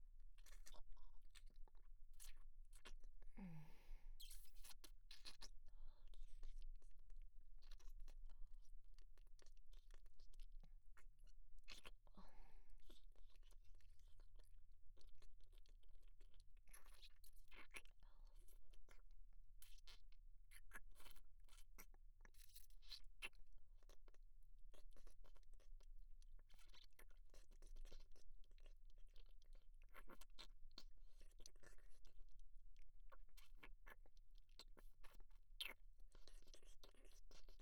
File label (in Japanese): 乳首舐め